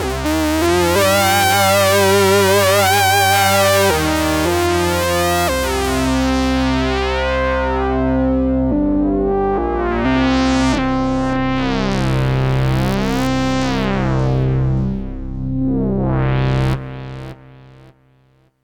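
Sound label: Casio XW-G1 solo synth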